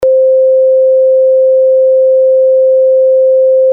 正弦波

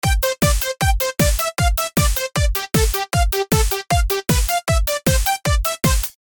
ノコギリ メロ ノイズ 有り

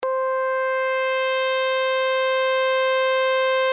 レゾナンス 効果

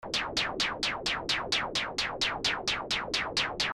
ノイズ 刻み パン

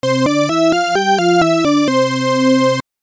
サブで補強

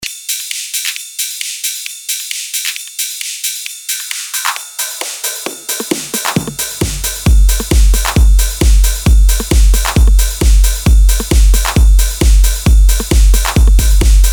ブレイクビーツ ハイカット 徐々 開け

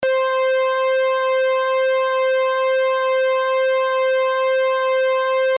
パルスワイズ LFO

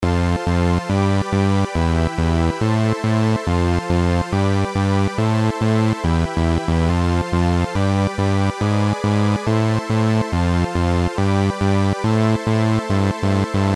シンセ音作りサンプルベース

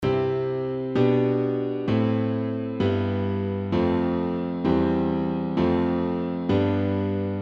カノン 進行

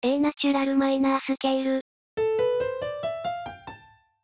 ナチュラルマイナー

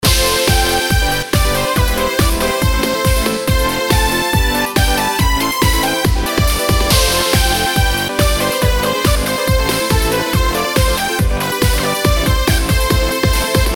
シンセ音作りサンプル attack pad trance gate

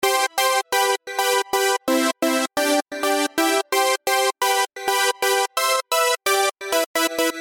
ユニゾン例

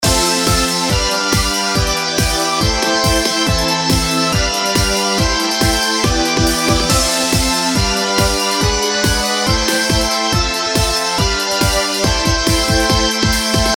シンセ音作りサンプル素PAD

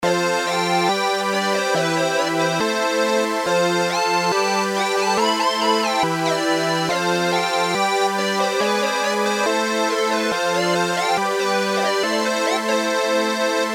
シンセ 音作り サンプル リード

